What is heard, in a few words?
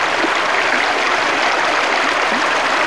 stream; Mafie 1